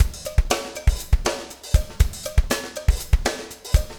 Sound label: breakout 120, 120bpm, loops